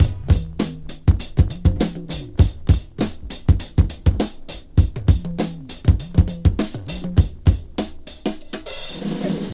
100 bpm modern drum loop A sharp key, kick tuned to the A# note